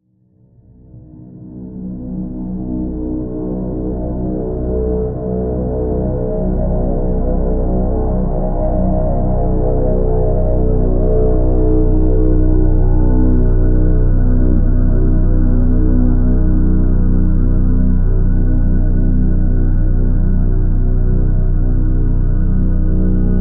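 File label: drone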